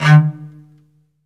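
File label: SOLO cello, Strings; ILIO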